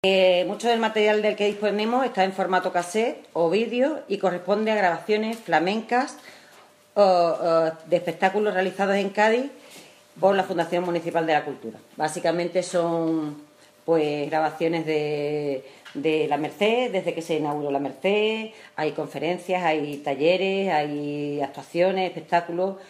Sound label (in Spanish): flamenco; archivo municipal